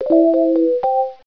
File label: incoming trade